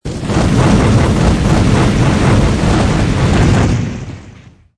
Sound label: gliding fire